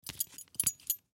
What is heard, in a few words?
Belt Leather Move, Belt Leather Buckle Metal; Foley; Blastwave